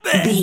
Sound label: voice male grunt pain